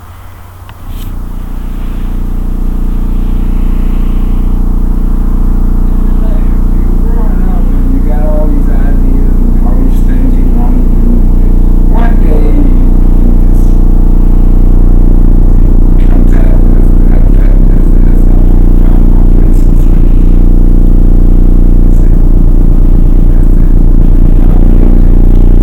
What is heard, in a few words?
voice sine; unlimited electronic sounds udk st2021